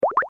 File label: destroy; eatball